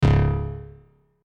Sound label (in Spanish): Fallar Disparo; SFX Gameplay 3